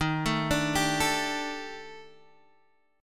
Eb Major 7th Flat 5th Eb Guitar Open G

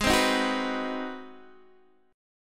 Ab 7th Sharp 9th Guitar Open D, chord

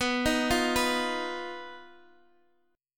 Eb Suspended 2nd Sharp 5th, B

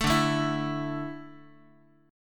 D Suspended 2nd D down Guitar, chord; x,x,0,0,1,3; strummed

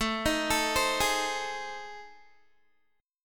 A Minor Major 7th Flat 5th, Guitar; AmM7b5; arpeggiated; chordshape; 17,18,19,17,20,x

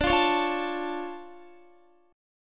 D Minor Major 7th D down Guitar; DmM7 strummed; JGuitar

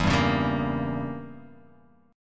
E Diminished 7th E, Guitar Open F; Edim7 strummed; JGuitar

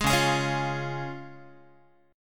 C sharp Major, Guitar; 11,9,11,11,9,x; strummed; JGuitar

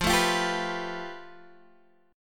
A 7th Suspended 2nd Sharp 5th

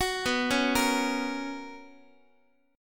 B Major 7th Suspended 2nd Guitar, chord

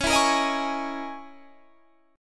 Db Minor 6th Add 9th Db down Guitar Standard; Dbm6add9 strummed; JGuitar

